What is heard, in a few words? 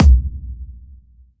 kick verb